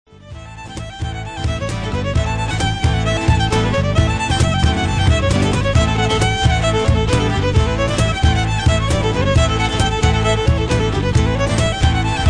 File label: Cello